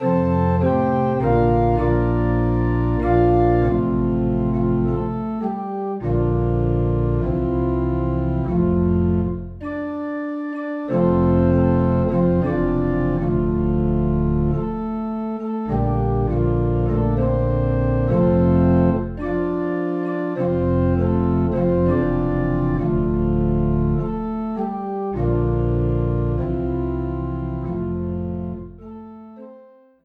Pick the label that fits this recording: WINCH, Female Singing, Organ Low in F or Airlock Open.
Organ Low in F